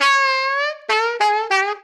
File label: Guffaw; The Act; Arcade, iOS, MacOS, Mobile